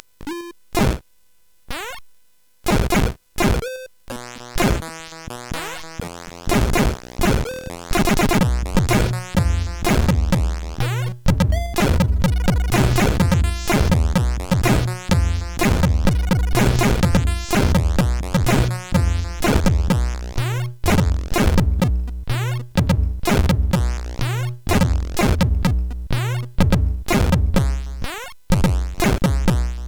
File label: Break-Beep, CSG8580R5